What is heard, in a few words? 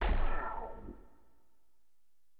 STAB, AcidJazz Funk Fx; LiveAct Pool